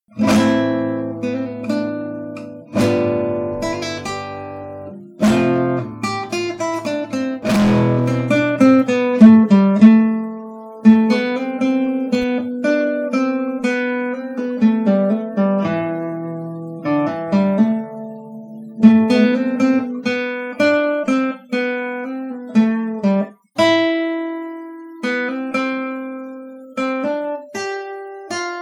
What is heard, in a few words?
spanish tango guitar